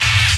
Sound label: clatter